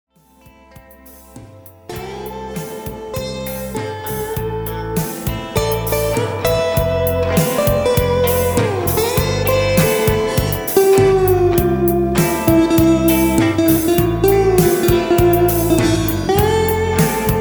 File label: solo